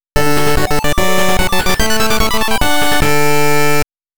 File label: 8-bit Jingle